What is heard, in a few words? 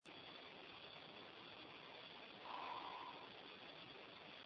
breath